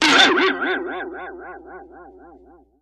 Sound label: Boing Box Sharp Vib